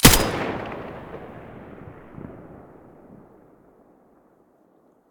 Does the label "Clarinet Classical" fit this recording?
No